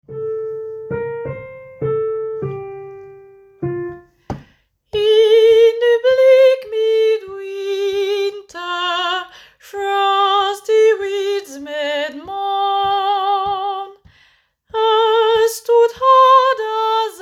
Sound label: In the bleak midwinter soprano